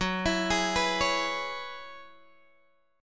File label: F sharp 7th Suspended 2nd Suspended 4th F sharp down Guitar Open G